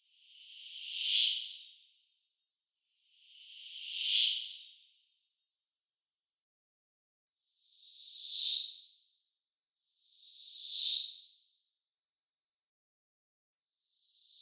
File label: Wooshes Both Ways